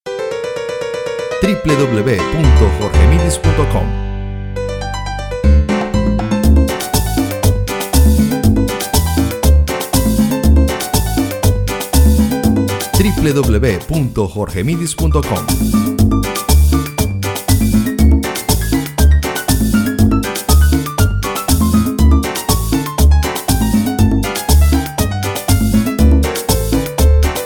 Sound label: Piano merengue